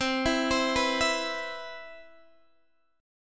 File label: C Major 7th, Guitar DADGAD